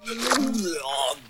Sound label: Barfing Loop; Sound FX